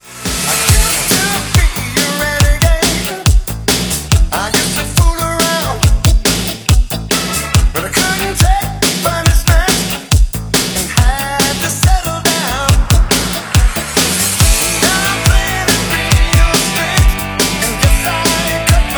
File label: 140bpm Em; 1986; Redrum; remixplanet